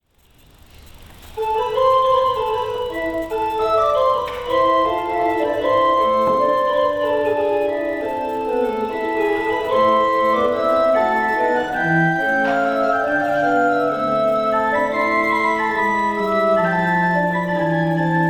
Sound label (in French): Basse de tierce, organ; Lambert Chaumont